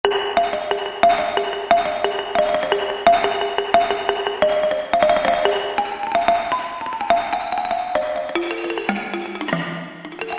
MALLETS - KULINGTANG; Spectrasonics Heart Of Asia

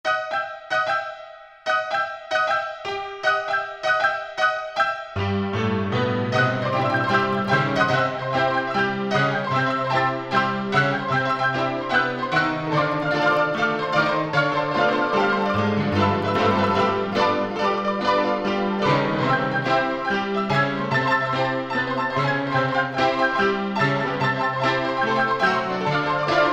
Player Pno, Stereo Jazz Piano

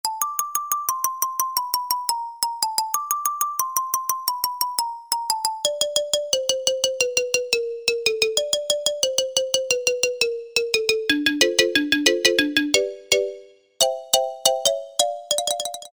Xylophone

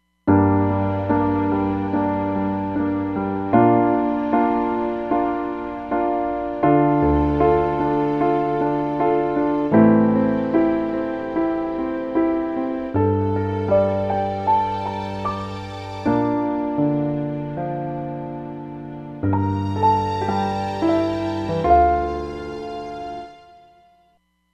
Ballad 88, Roland Fantom XR

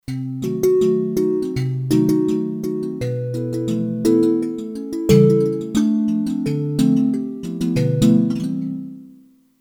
Dualimba, Kurzweil K2500X; Factory Programs

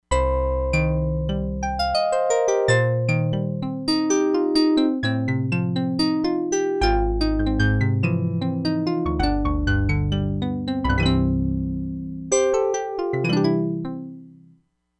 DIGITAL PIANO, Crumar Bit 99; Factory Programs

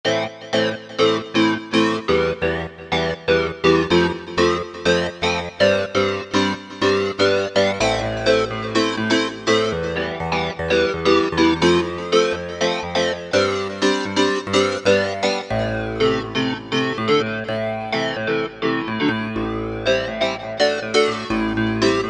Dance Pno, E-mu Proteus VX

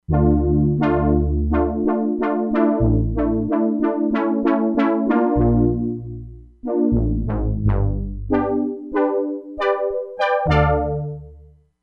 SOFT BRASS WITH RELEASE, Crumar Bit 99; Factory Programs

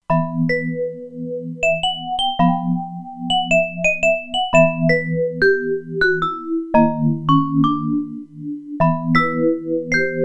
Vibraphone, E-mu Proteus-2; Factory Presets

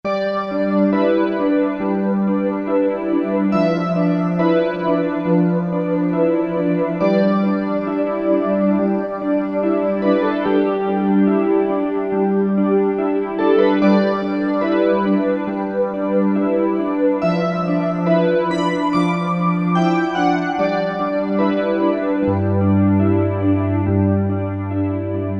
MIDI Vox, Korg MSC-2S Synth; Synth 1

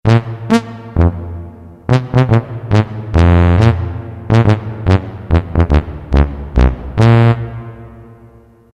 Tuba, Roland MT-32

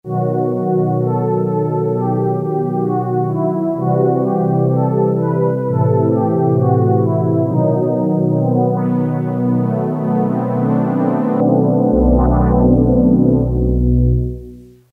Roland M-DC1; Nice-n-Easy

